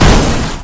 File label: Car Crash